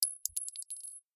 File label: weapons bullet casing; FMOD; Bullet Casings